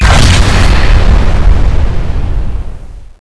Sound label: bomb exp; cstrike; zombie plague; killbomb